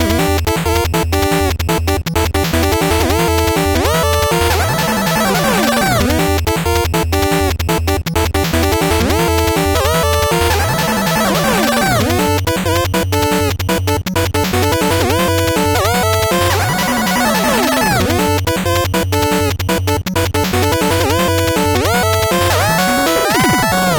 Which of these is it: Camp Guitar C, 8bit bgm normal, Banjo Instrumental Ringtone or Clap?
8bit bgm normal